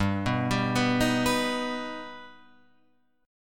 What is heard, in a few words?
G Augmented G Guitar Open F; G+ arpeggiated; JGuitar